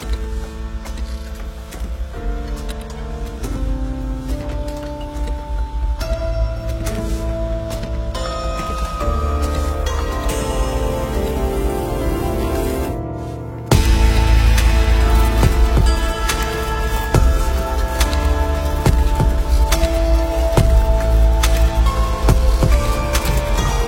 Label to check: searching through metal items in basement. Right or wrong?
Wrong